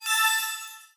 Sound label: correct; bettermarks